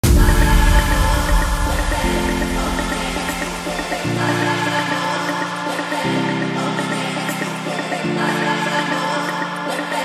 afro house

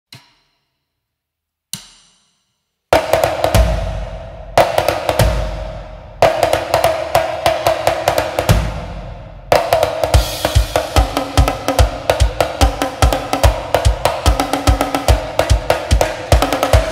drum cover

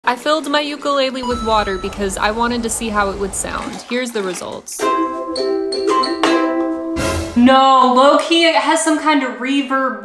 ukulele with water; I filled my ukulele with water and it sounds unreal

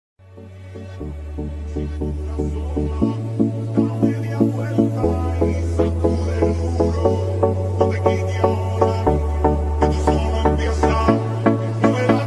bass boosted song

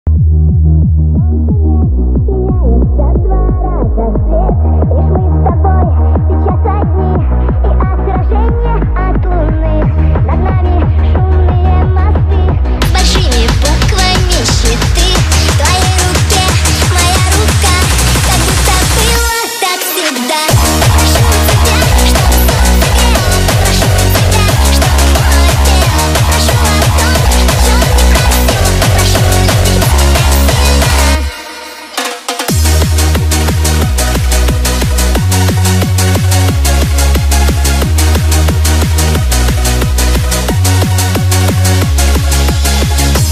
russian hardstyle remix

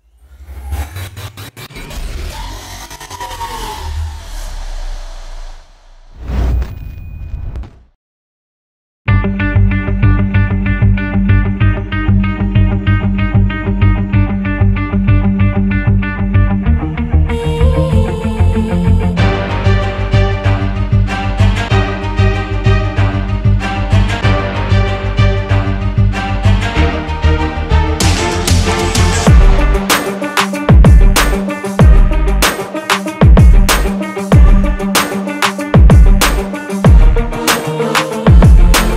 hard motivational rap beat uplifting; prod jordan beats

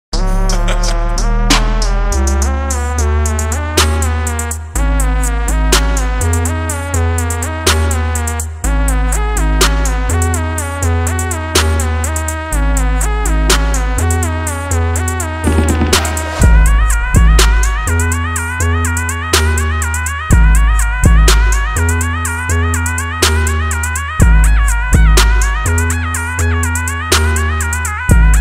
instrumental tiktok remix

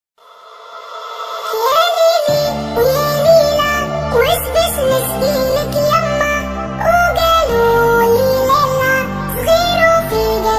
arabic song

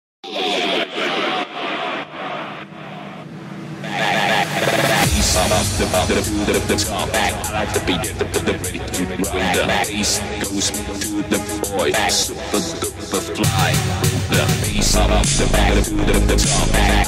italo disco eurodance

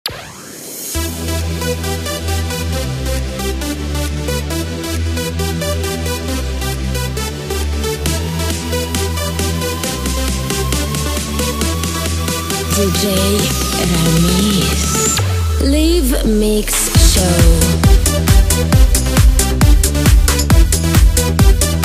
eurodance 90s; cover hit mix 90s vol 1; eurodance 90s collection 2023

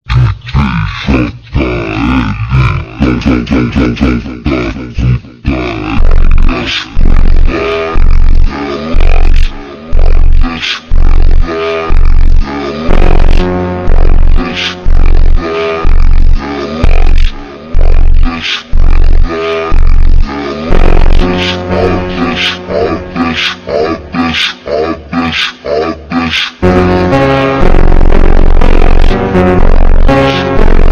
ultra slowed reverb; shin sonic edit

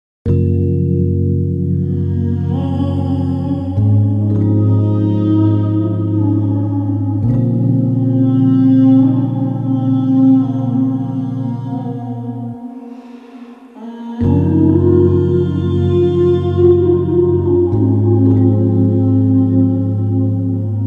slowed down

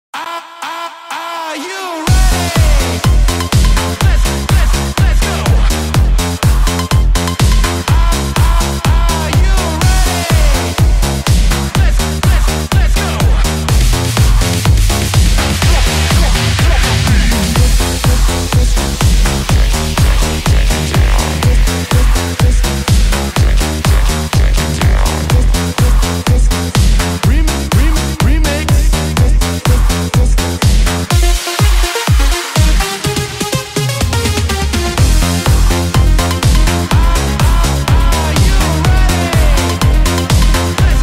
disco dance; new disco dance hit

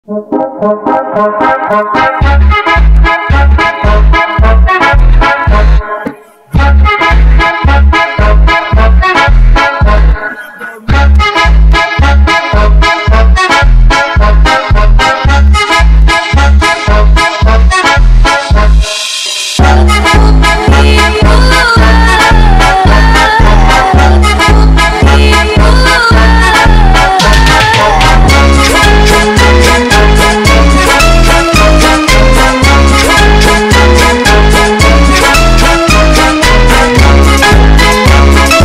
funk do bounce instrumental

